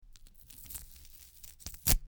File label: Potato peeling